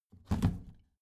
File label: plastic trash can open heavy